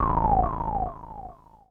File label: KEY SHOT TEKNO 140 A; Techno2; Singleshots